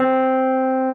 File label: rhodes; TamTamMicro.activity